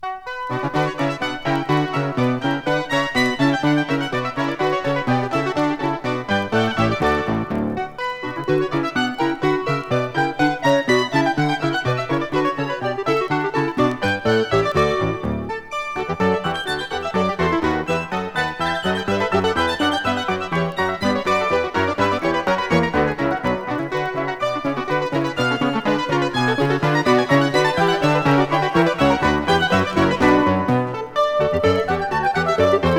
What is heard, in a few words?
Bourree; Selections From Suite No 2 in B Minor